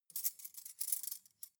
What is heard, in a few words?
cutlery cake forks handle